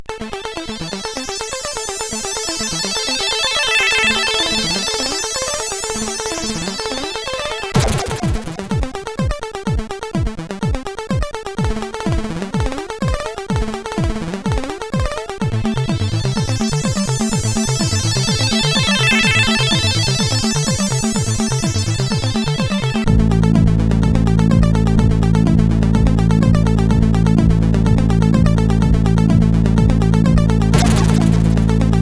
Damage, CSG8580R5

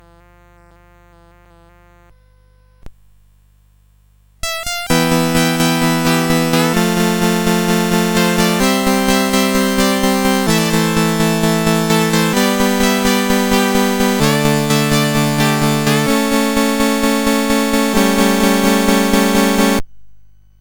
Pluck, CSG8580R5

